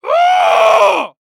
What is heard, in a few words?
Human Male Yell Frustrated Caveman Yell